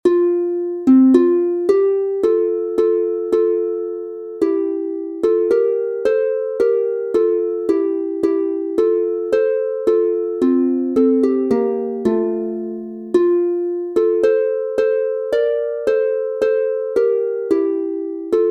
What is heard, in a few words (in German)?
F-Dur instr, Harfe; Wanderlieder; Auf du junger Wandersmann